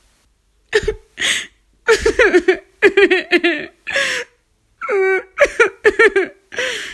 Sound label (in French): Tristesse pleurs